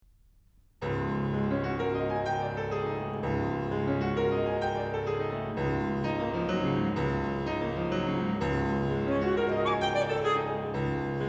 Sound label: alto